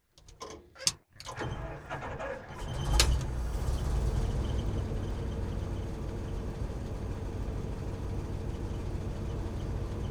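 M1 Corbitt onboard startup idle shutdown interior, Prime Mover Cargo Truck; Pole Position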